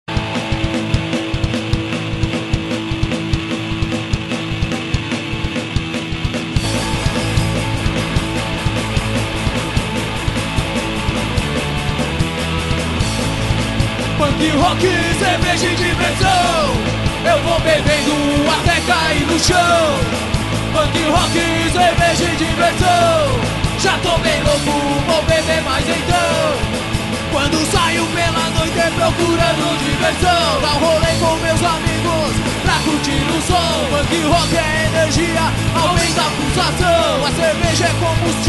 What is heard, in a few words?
punk rock